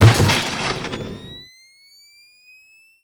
remote mine plant; techies

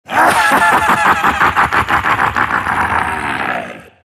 silt laugh; dota; siltbreaker

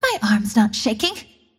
deny pain; Windrunner

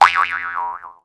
taunt boing; weapons; dota; monkey king

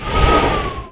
preattack; dota; crystal maiden

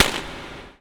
firecrackers; ambient; newyear; dota

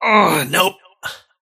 teleport pain; dota; kez

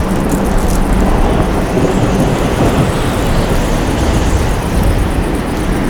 storm loop; weapons; dota; razor

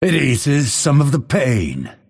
lasthit pain; dota; phantom assassin

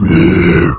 death satyr; dota; creep deaths

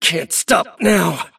move pain; davion; dota

